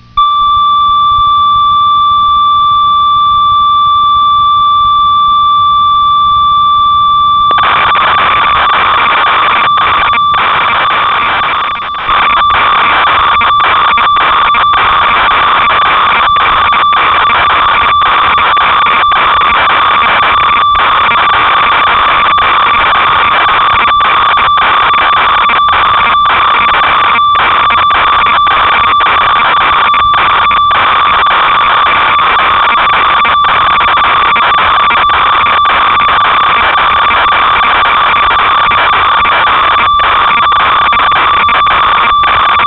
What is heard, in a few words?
ob6, synth; Keyboard Bank A - 100 Patches